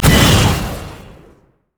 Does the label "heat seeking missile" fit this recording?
Yes